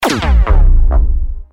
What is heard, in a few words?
all lights on, pinball